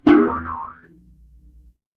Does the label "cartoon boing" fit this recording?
Yes